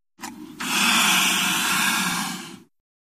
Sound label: Lion Hiss Single Deep; big cats